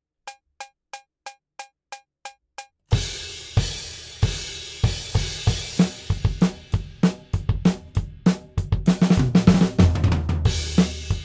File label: Drums ONLY; Pre Pro